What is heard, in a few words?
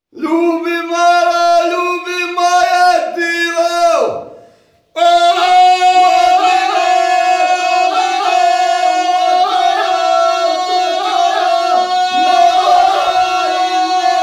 ojkanje, Croatian Folk Singing